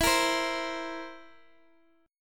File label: Bb Suspended 2nd Flat 5th